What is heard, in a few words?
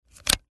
insert module; Modules